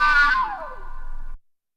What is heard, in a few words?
Stab Twirly